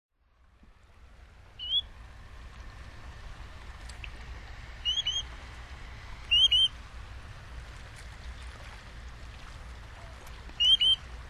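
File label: Grey-tailed Tattler, hyogo; kiashishigi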